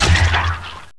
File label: die zombdroid Servant